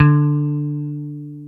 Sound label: DAN OPEN D4, BASS